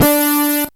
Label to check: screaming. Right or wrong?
Wrong